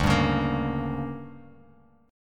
D-Major 7th Suspended 4th Sharp 5th, Bass Standard; DM7sus4#5; strummed; JGuitar